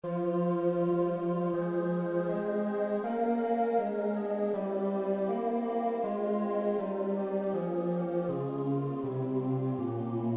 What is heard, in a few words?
Bass; Schoenster Abestaern